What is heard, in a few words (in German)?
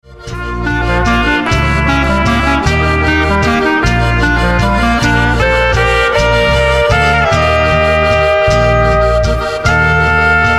Seerosen Walzer